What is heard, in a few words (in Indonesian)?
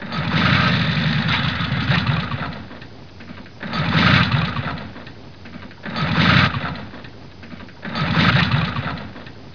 suara mobil